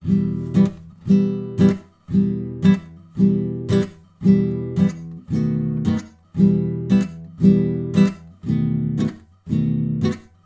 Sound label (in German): Gitarrenbegleitung; Go down Moses